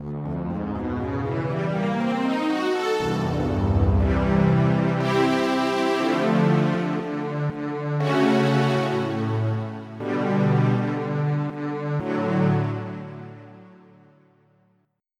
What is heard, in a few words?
Synth Str 2; EverQuest